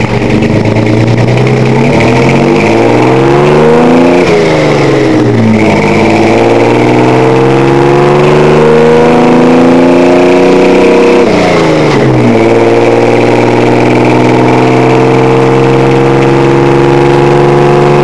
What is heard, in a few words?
v6 sound, engines